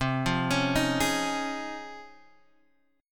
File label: C sharp Major 9th, chord